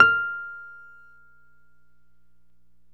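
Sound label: E PNO F5 FF; Synclavier Sampler Library; KEYS AND GUITARS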